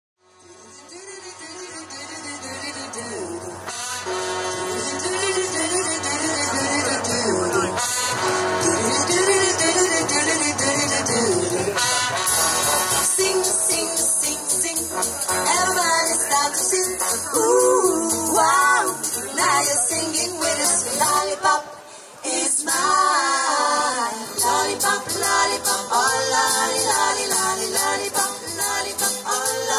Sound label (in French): Douce Ambiance